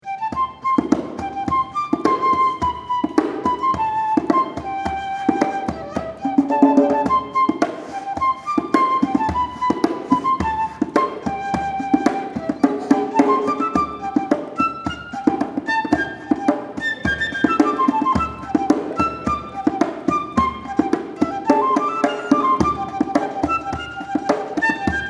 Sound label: flute